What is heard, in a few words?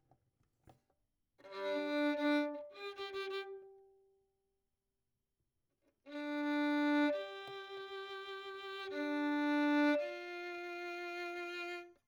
Violin; Verdigris Music